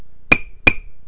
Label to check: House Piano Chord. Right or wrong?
Wrong